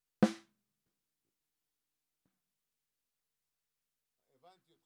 SNR

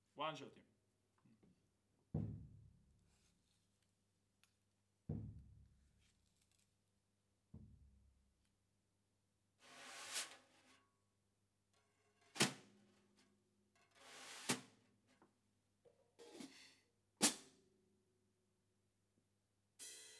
Ride

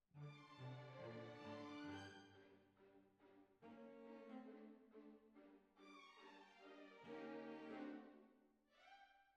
Cbasses

